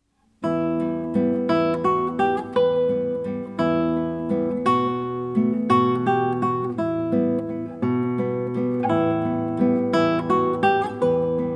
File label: Waltz, guitars; Calatayud